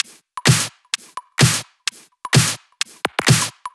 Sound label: Loop, 128 BPM